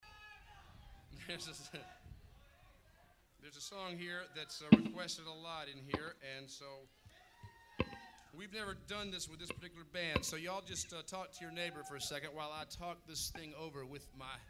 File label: crowd